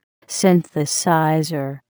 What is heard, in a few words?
synthesizer